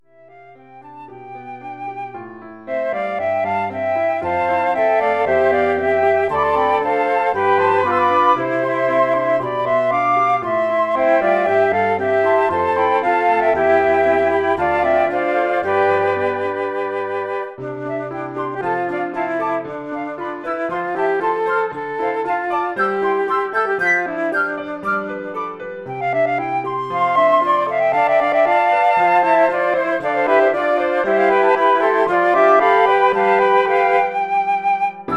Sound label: flute quartet